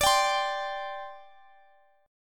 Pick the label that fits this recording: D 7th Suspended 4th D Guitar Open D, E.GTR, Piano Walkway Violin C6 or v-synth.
D 7th Suspended 4th D Guitar Open D